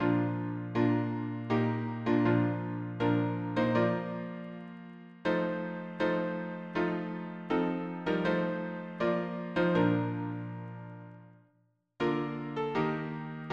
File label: harmony piano